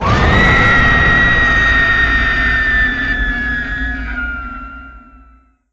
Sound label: scare